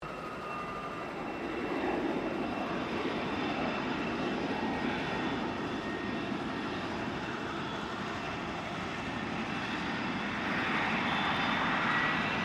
AIRCRAFT GLOSTER METEOR F MK8 TAXI DISTANT; Gloster Meteor figter jet; Airplanes

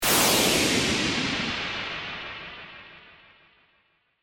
Hit Descend Laser; Hit Sound Effects; Sound Design; BlastWave

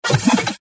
Flash Animation Multimedia; Web Interactive; Technology; Buttons; Blastwave

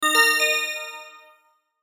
chime melody bright; Chimes; Multimedia; Blastwave

